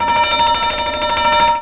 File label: ALARM BUS; CLICKS